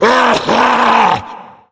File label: tank yell, voice yell; source engine; left4dead2